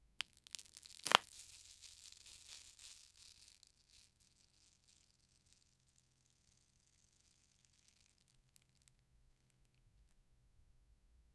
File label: a large gummy candy flies